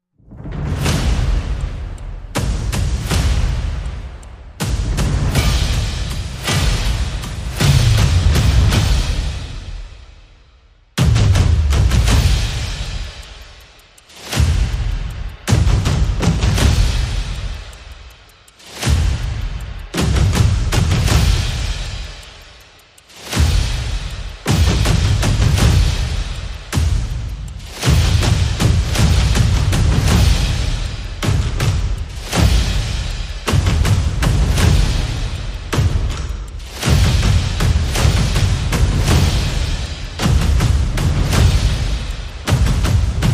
Perc and FX

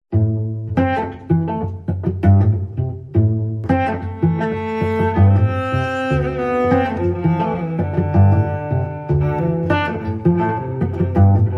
Cello Suite 2 Variation 3

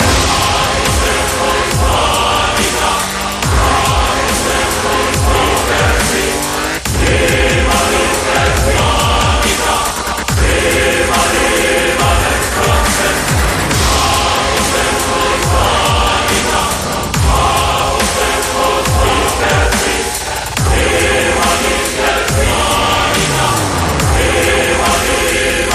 Choral Crash

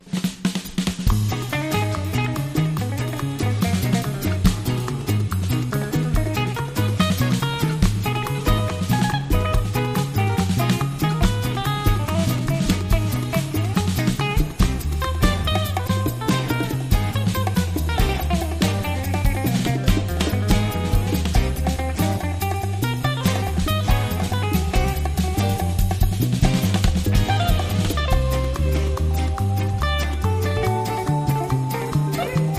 Jazzing, Guitar

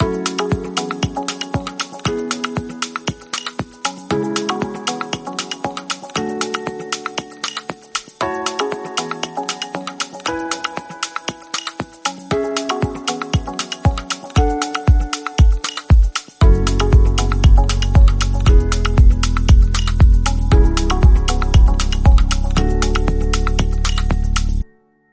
Percussive tranquility Rhythm

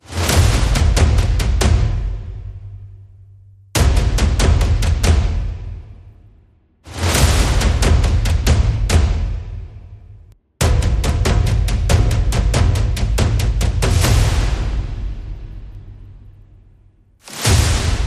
Mammoth Hunters, Percussion Only

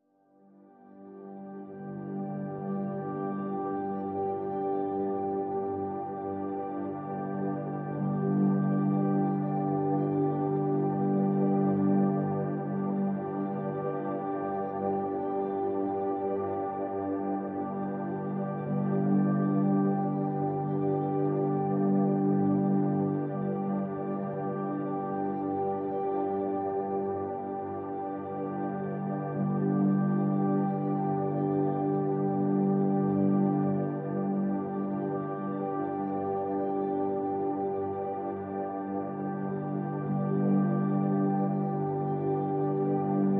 Pads (G)

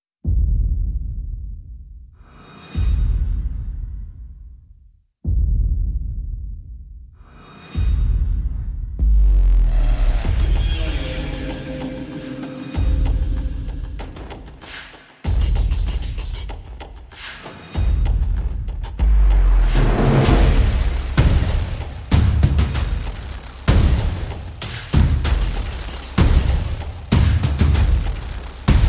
Perc and FX

